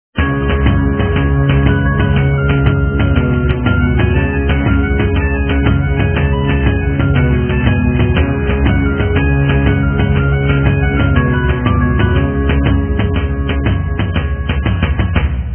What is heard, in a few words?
Egyptian Temple Dance